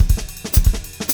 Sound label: DOODLE, Drumscores, LICK; Steve Gadd Drumscores; GEMS